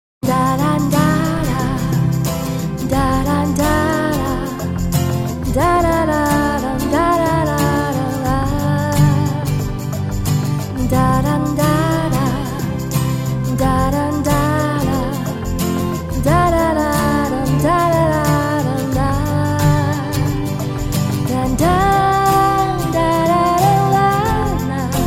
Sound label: Romantic; Oppo Real-R801